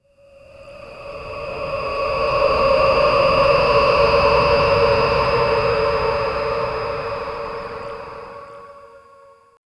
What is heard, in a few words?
a dark elven transformati; eerie silence